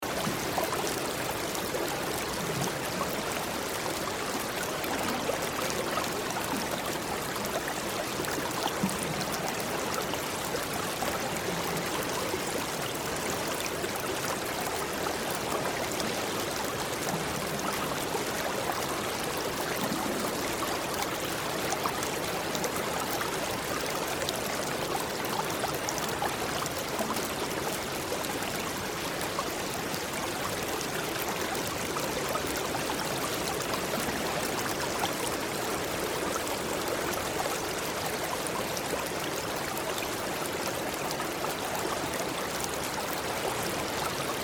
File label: Gentle Stream Background